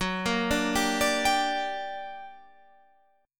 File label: G Minor Major 7th, Gb, guitar chord